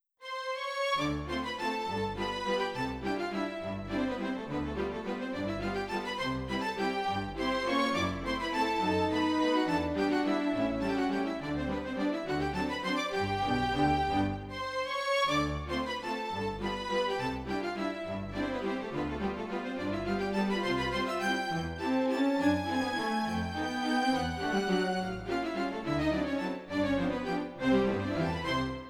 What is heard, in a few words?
Strings, pianino cyfrowe; Yamaha NP-15 WH